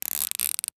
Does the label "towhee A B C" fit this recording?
No